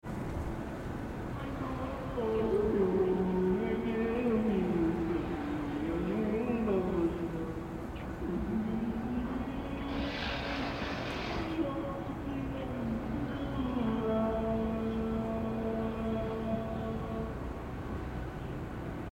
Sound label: karaoke